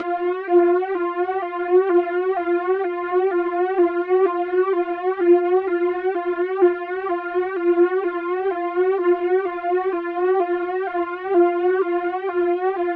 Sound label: Alien Alarm